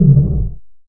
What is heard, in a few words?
taunt land; weapons; dota; luna